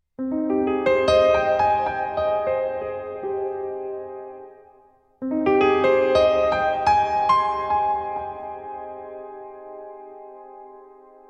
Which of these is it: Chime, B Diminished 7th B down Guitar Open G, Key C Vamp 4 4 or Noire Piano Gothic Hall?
Noire Piano Gothic Hall